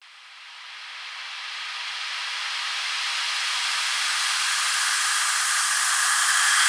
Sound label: Exhaust Breath Reverse; Processed